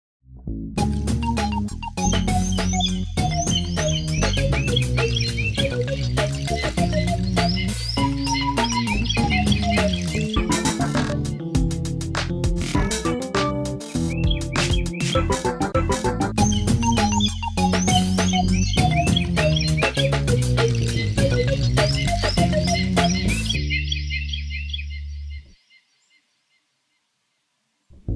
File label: Drum n Bird